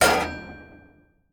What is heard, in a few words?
collision metal; flip the water